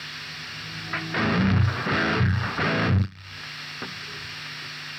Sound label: staccato chords no gate